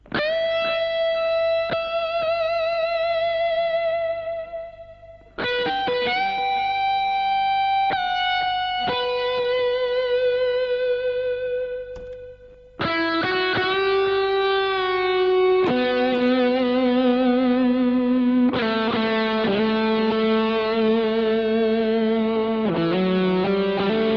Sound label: Romantic Guitar